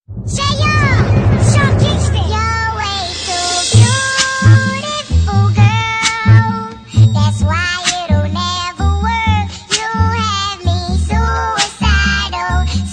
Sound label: song